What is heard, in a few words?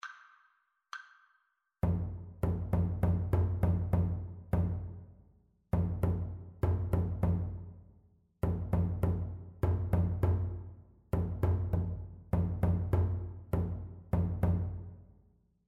rhythmic dictation